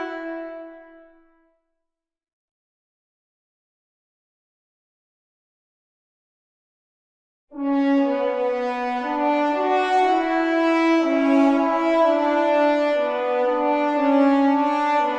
Solo Horn, горн соло; звук для фильма приключения

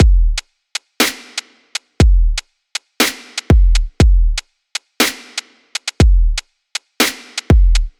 Bling Kit A, electro dub; сэмплы ударных

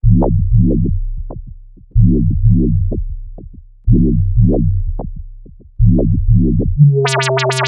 Wobble D, break beat

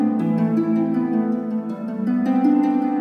Harp

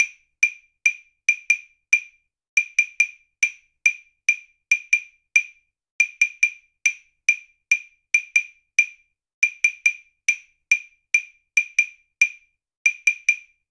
Clave 140 BPM; перкуссии percussion loops

